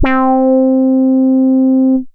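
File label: lead C4, ARP 2600; Polyend; Simulacrum Sounds; 1 Shots; Synths; Keys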